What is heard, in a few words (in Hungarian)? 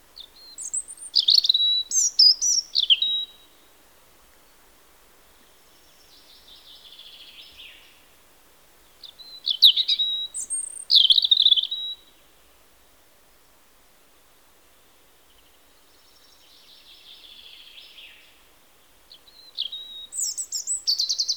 Vörösbegy